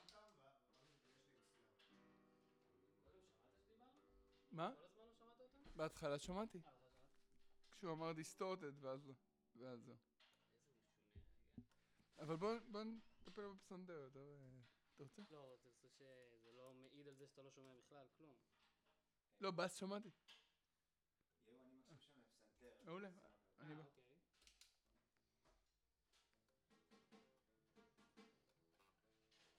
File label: Bass